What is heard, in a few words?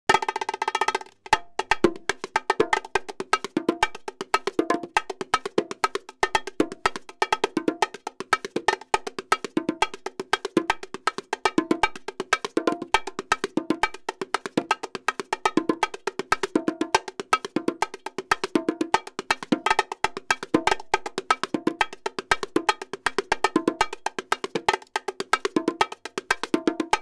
Instrumental, Bongos